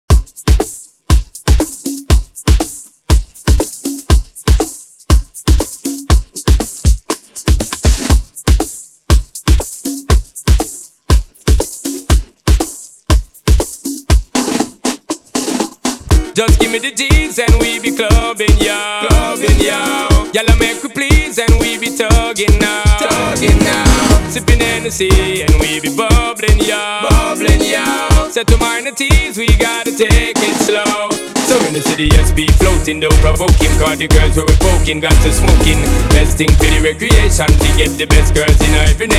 Redrum 120Bpm

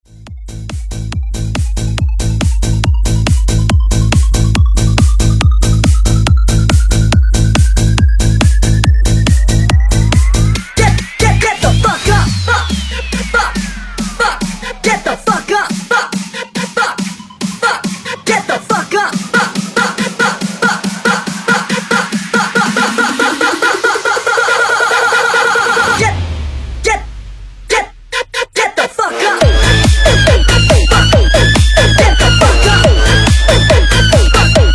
Vina Bounce 140 Bpm

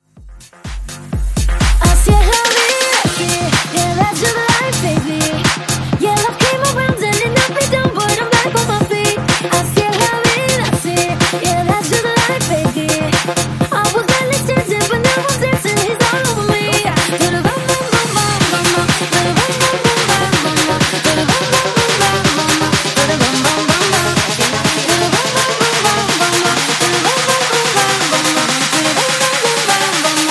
Top40 Club Smashup

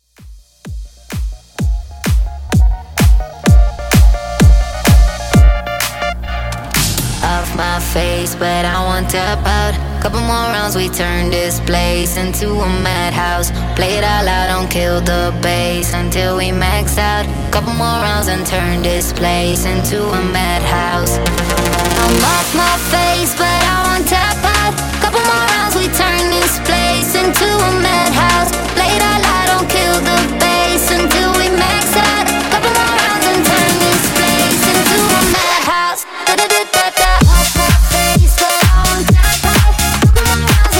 Club ReDrum 128Bpm

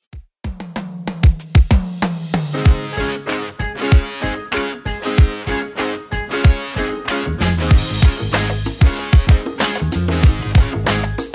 70s Real Drum 95bpm CLEAN

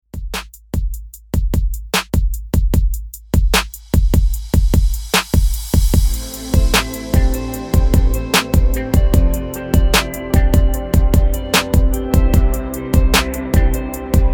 REDRUM 75 BPM

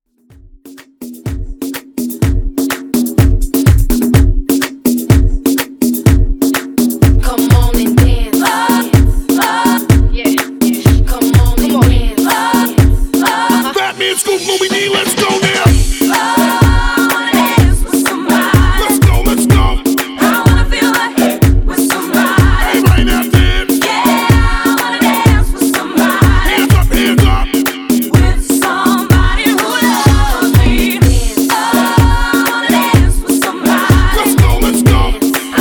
Dance Redrum

